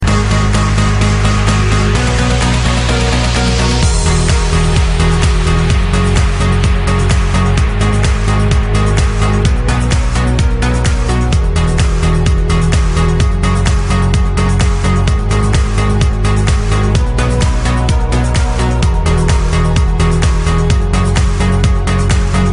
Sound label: Club beats House